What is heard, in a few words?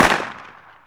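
magnum exterior shot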